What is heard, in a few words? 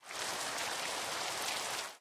rain, ambient weather; rain1; minecraft; resourcepack